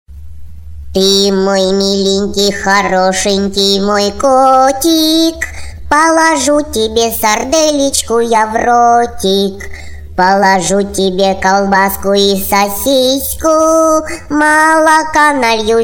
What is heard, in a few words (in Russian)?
Kotenok; Detskij wanson